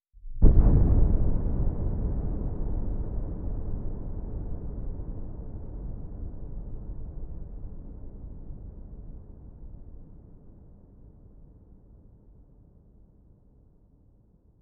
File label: chicken fall; helen pynor